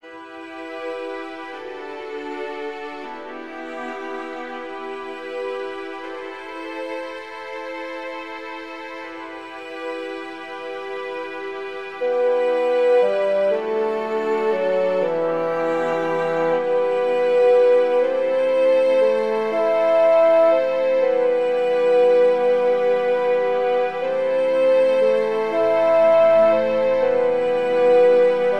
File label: Intro, romantic, renaissance